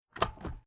Transport Agriculture Quad Bike Motor Bike Gear Shifter Shift Down